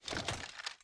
Inspect Mvt D, weapons; odin

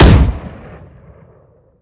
vandal

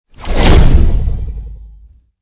deadlock barrier active

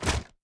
Inspect Mvt B, weapons; odin

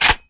weapons; chg hndl fwd; vandal

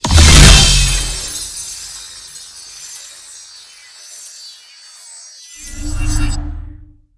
heal orb take; cstrike; Valorant

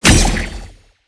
weapon take; cstrike; Valorant

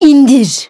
release wolf; fade

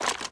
equip mvt; bucky

